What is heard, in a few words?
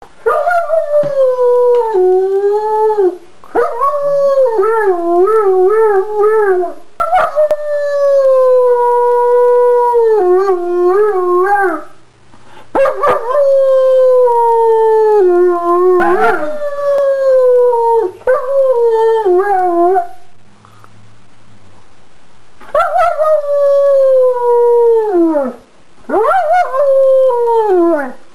howl, pets; livonia mi us